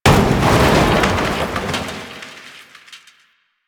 Desk Destroy; ambience; Warframe; Entrati

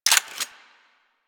Hand Shotgun 2D Mech, Weapons

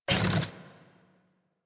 Mech Step Arm Up; Nechro Mech; Vehicles

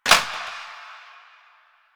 Plastic Drop; Warframe; NineteenNinetyNine

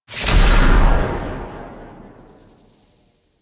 Radial Blast; Warframe; Excalibur

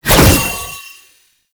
Rune Spawn C; Warframe; Uriel; Vythelas

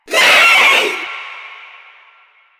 Vocal Short Attack; Enemies; Warframe; Proto Infested; Runner

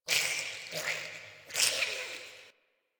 interior squish gurgle; Ambience; Misc Indoor; DE; Warframe; Infested Eris

